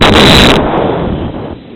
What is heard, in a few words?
chocolate milk